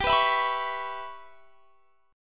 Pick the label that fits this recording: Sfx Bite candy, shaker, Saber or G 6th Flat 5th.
G 6th Flat 5th